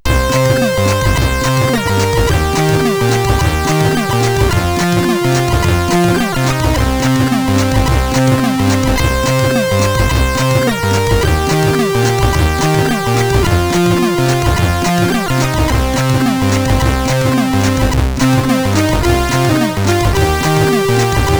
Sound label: Old Music, CSG8580R5